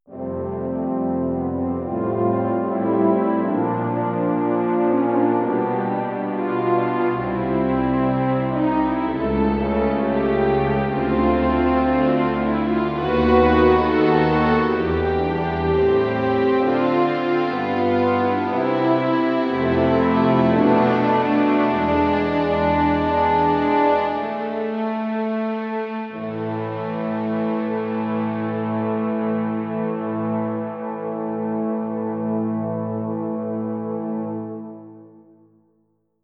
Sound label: Orchestra; VIVO H10